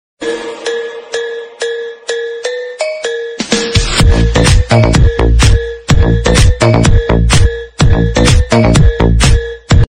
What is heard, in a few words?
Mobile Ringtone